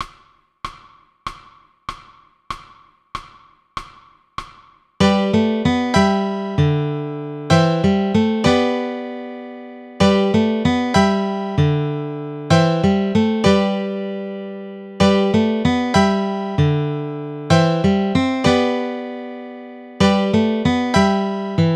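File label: Guitar Melody Chord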